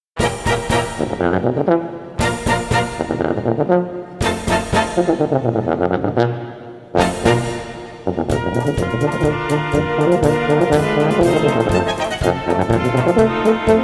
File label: Tuba; Tuba Muckl